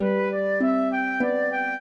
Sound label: trio, flute harp; Mozart